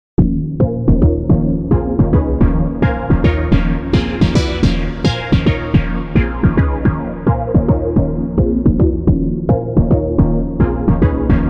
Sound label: LC Cell Chord